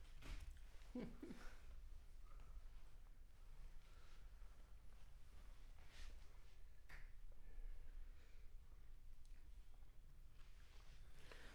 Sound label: Center u47, Choir